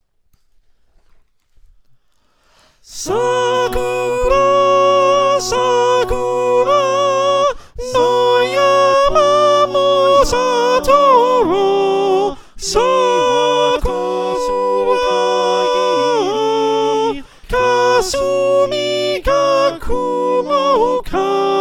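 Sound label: soprano, choir; Sakura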